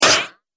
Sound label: Multimedia Button